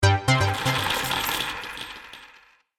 Game Gold Treasure Bonus, Video Game Sound Effects